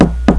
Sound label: knock